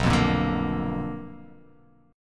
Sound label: B Minor Major 7th Flat 5th, chord